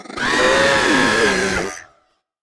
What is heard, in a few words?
Death